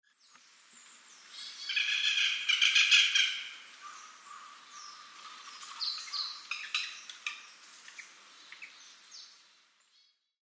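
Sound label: Japanese Green Woodpecker; aogera; nasushiobara; koe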